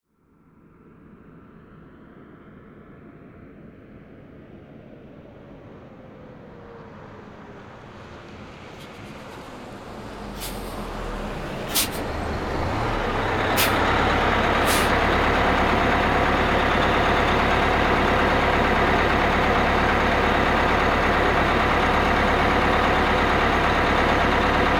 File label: Bus Pull Up